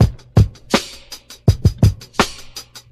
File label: 82 bpm drum loop sample d sharp key, Free drum beat - kick tuned to the D# note.